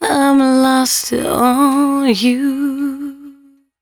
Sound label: 113bpm C#, Velvet Vocal; Zero-G